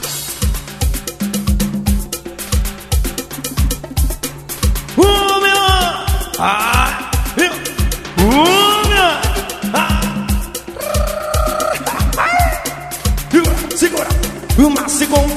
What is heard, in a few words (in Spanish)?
cumbia cumbia cumbia